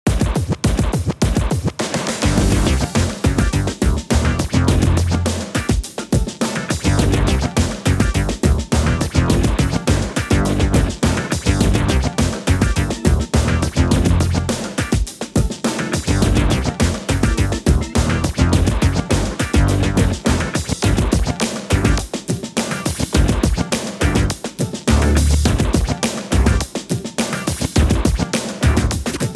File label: count up; Advance Wars 1+2 Re-Boot Camp